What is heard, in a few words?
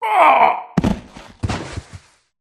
mage student death